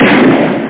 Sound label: Gunshot; pokermania